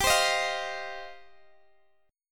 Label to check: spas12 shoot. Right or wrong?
Wrong